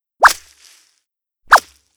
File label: Heart Mouse Action